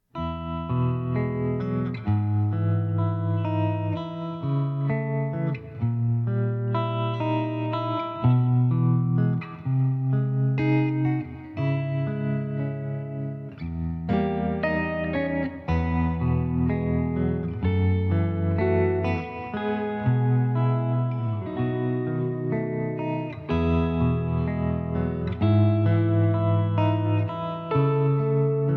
two guitars

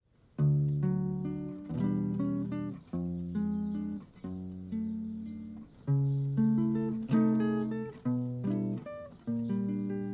duet

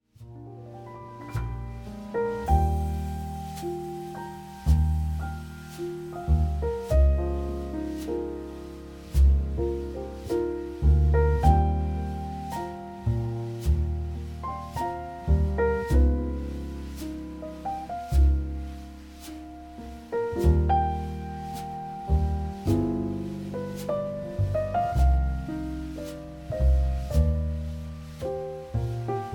jazz lullaby

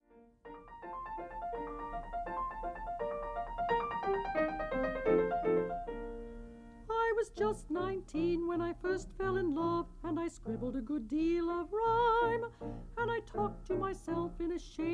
parlour piano

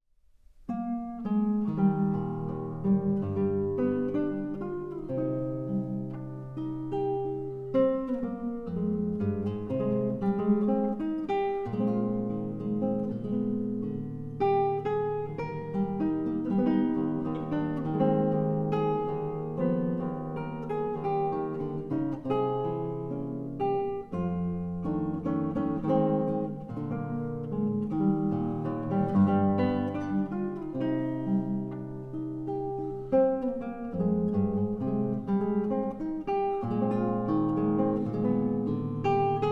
my guitar story

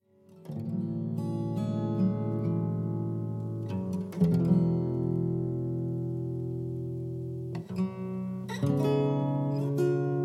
guitar

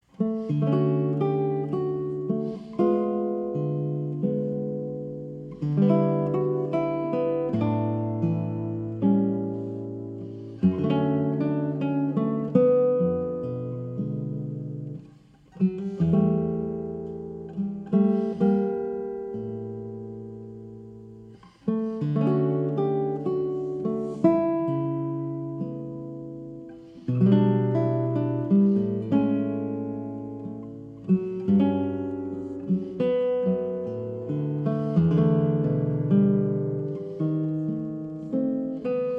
violao violao

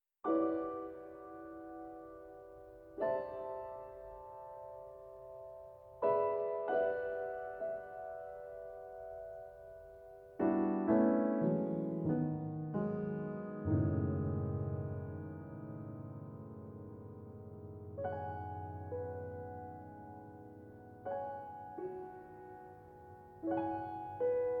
piano sketches

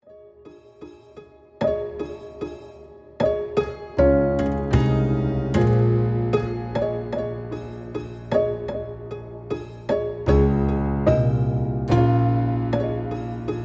silent rain

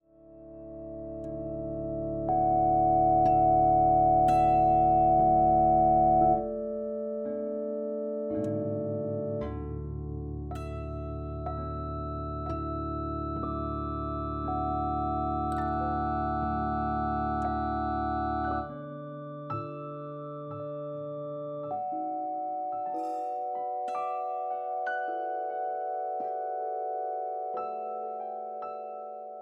silent rain

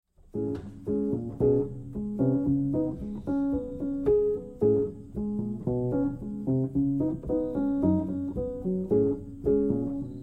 piano

